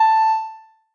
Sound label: noteblock a2; mesecons